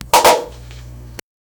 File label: create a sound of victory